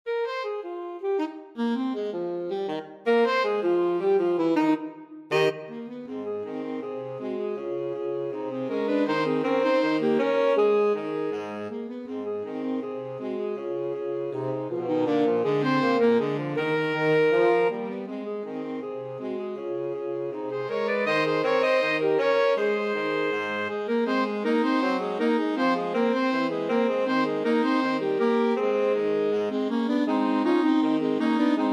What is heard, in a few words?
2 altos tenor sax